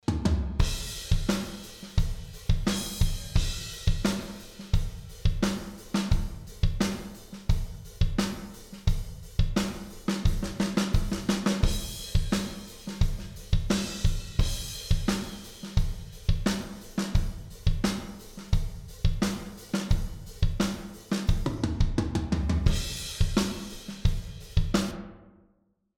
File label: reverb, drums; drums-dereverb